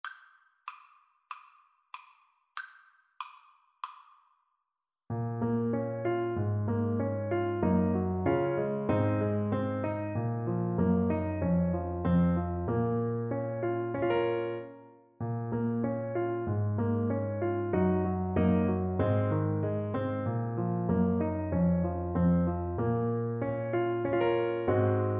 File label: the foggy dew, Trumpet